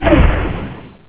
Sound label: dwarf cartridge; Warcraft 3